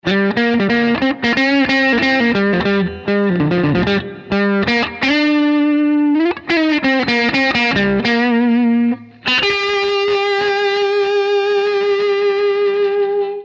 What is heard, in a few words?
crunch